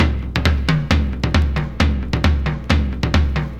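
crystal tribal pump drums - conga and tom - C minor 134; percussion loops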